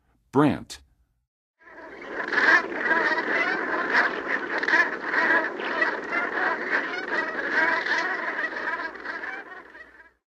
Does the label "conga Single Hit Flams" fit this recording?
No